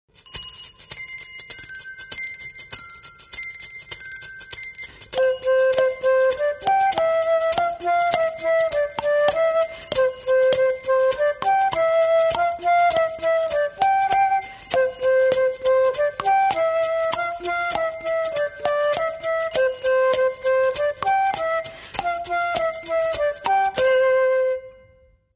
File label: instrumental; petite poule